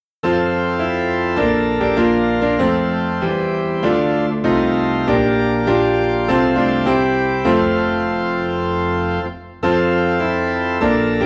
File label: Go Tell It On the Mountain, Piano and Organ in F; christmas